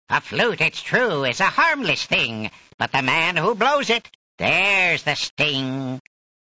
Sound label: flute